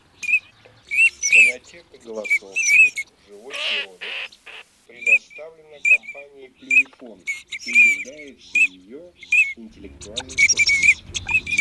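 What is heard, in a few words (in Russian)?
Чирок-свистунок Alzavola, манок; chip mini 234 plurifon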